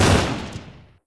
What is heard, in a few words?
oicw fire, weapons